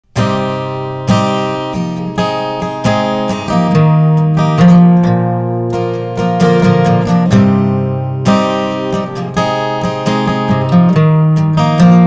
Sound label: Acoustic Version